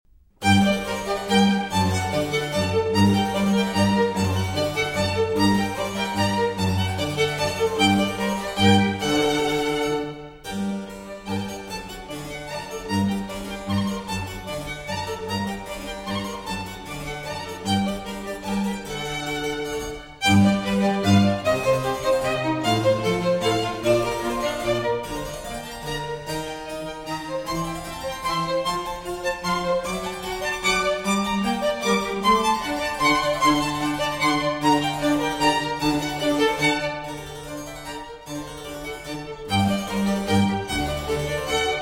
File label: Trio Sonata in G minor for 2 Violins and Continuo